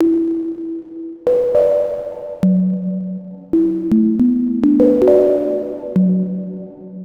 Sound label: Bpm136 Locked Mallet Loop Em, Dubstep; FX Loops